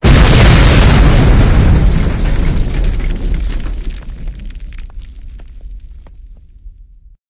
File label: light debris, explosions; hl2; impact